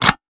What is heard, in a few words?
weapon foley pickup; Stereo; Real Guns Sound Pack 2